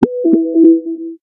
notification; bladerunner; joi

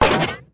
mini pekka step

